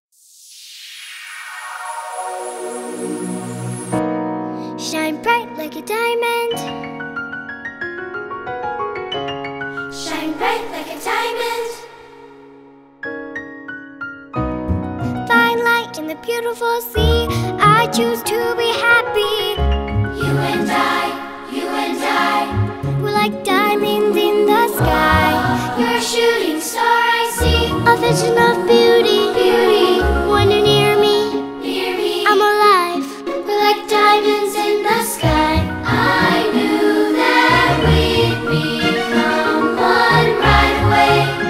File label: Cover by Children's Choir